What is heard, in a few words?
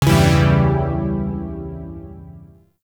Phrygian Chord E, Zariman Shawzin; Music; Warframe